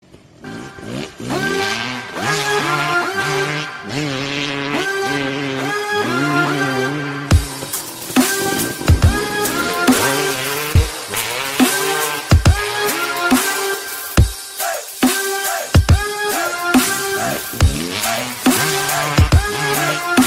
cool hip hop ringtone

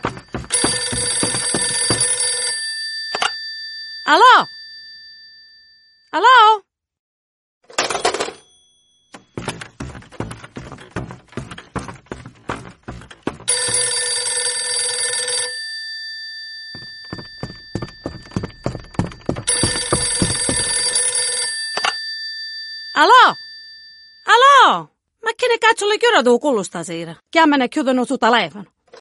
Italian phone tone ringtone

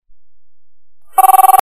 phone ring ringtone; matrix

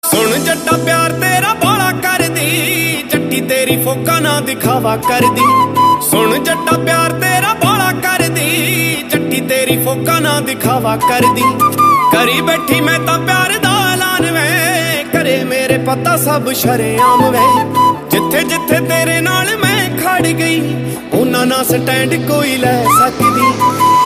punjabi ringtone

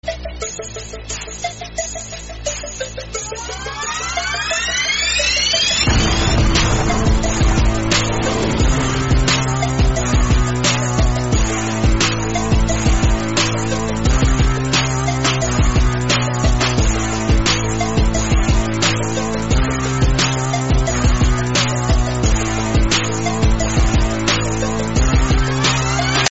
s8 instrumental music ringtone

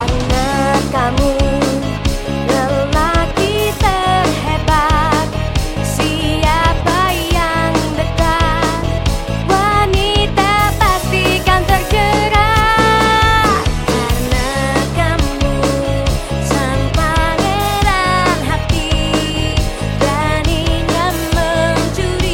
indonesian ringtone

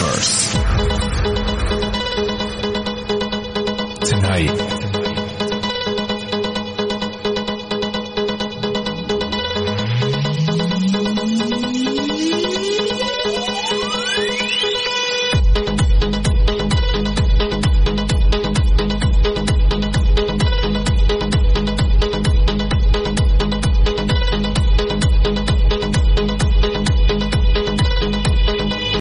electro dance ringtone

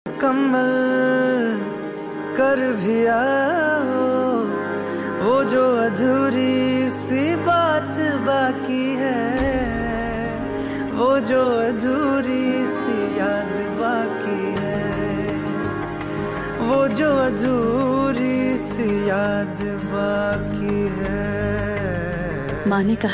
sad ringtone

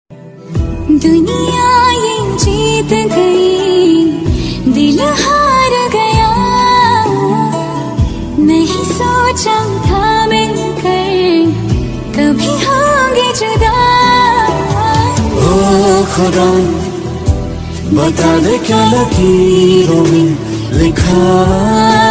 love song ringtone

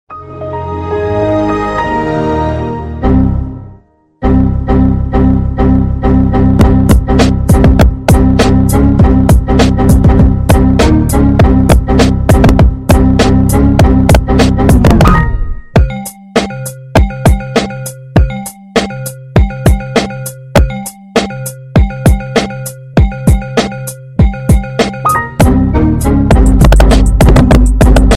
error ringtone

